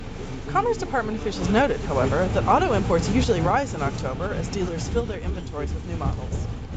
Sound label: real bus